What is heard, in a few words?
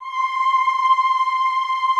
AHH C5, Classical Choir; Best Service; Extended Classical Choir